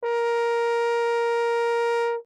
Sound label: Horn ff Bb4, Brass; MIS Pitches - 2014